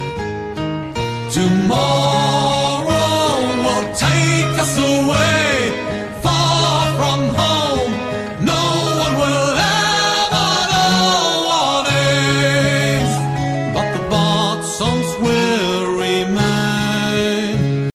Bard Song

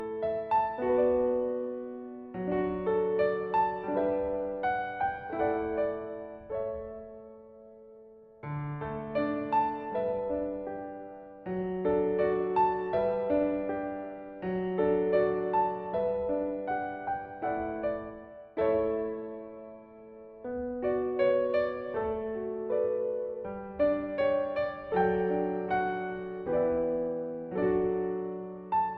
menu; Naruto storm 3

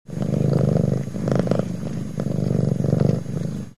Purr

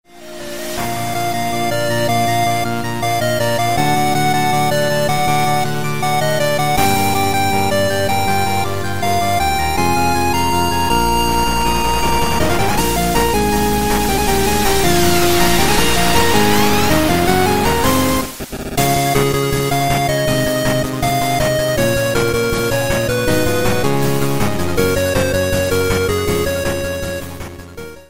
8bit; hunterxhunter

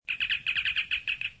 communicator beeps

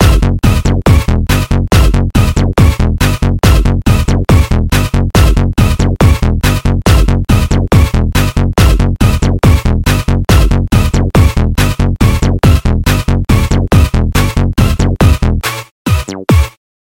dubstep sound

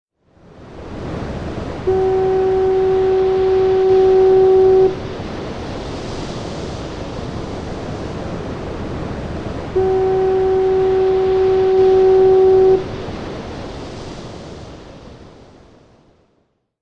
Sea and a Ship

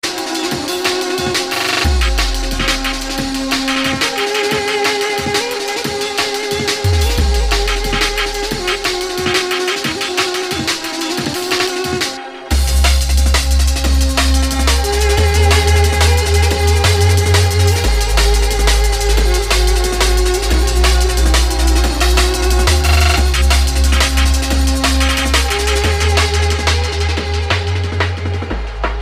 jungalistic, reggae

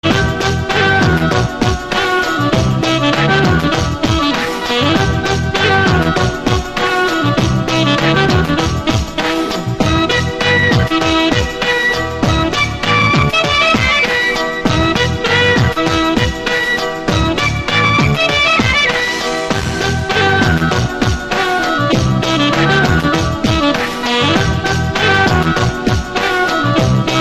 best marathi tone

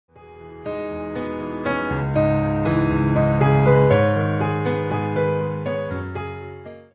piano tribute

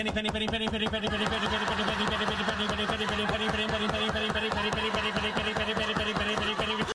Knock; Sheldon Cooper

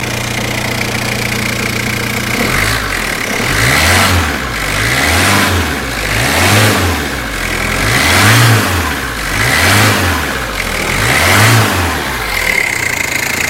Diesel starting

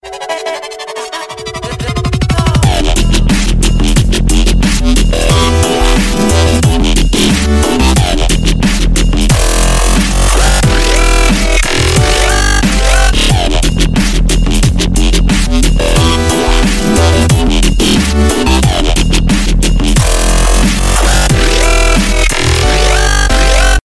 Dubstep

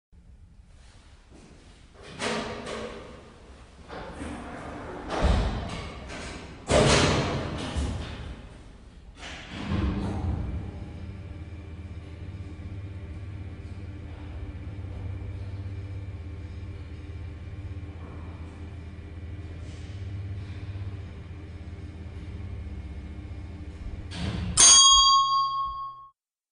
Elevator sound

